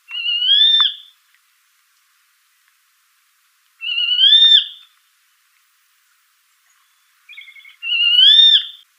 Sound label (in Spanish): Lorito-tigre Pintado